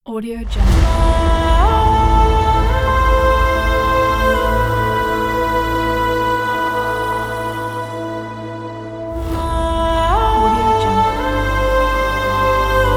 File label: ethereal female titles trailer